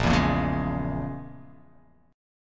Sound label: A sharp Minor 7th Flat 5th A sharp; strummed; x,1,2,3,1,3,x,x